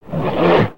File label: Pet Bison Grunt; creatures pets